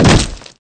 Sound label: land